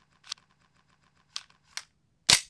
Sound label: short reload; 3D Game Programming All in One; KOOB